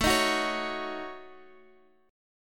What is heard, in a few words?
A 6th Flat 5th, A6b5 chord, guitar; strummed; JGuitar; x,x,7,8,7,9